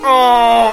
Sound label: uhh